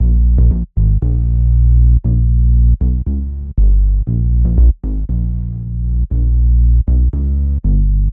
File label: bass pop 118 bpm; sc essentials